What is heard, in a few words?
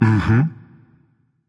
agree berserker; cuchulainn